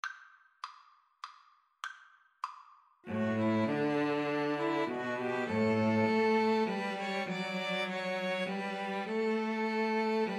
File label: first noel, string trio